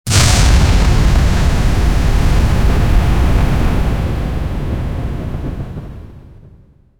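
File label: sound of a fireball charge; charging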